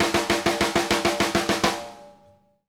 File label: SNARE, drum fills; Sampleheads - New York City Drumworks VOL-1